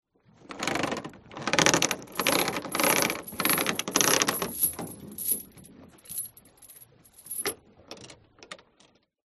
transport boat anchor on chain pull up against boat hull fiberglass